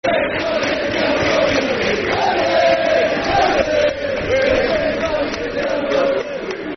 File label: Wembley Football Chants (Soccer Songs), Cardiff City; fanchants-com